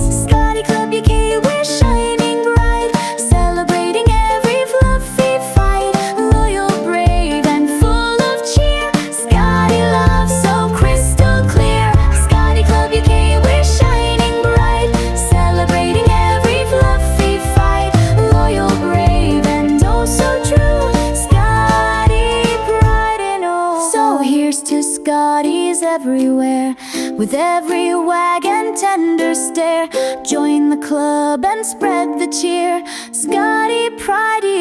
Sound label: Ringtone